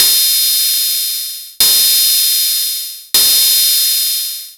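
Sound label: open hat, drive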